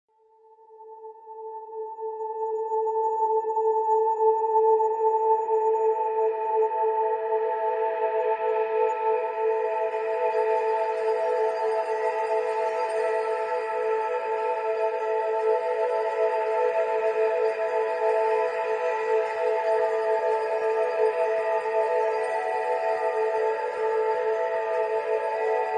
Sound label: Eastern Province; Brand X Music; Brand X Music Catalogue - Editor's Essentials